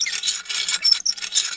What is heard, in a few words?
crane squeak